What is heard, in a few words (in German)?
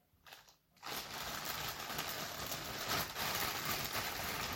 Plastiktuete